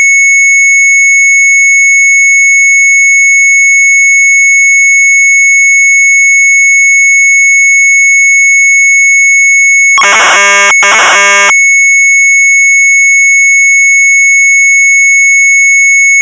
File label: MZ800 Tape; sharp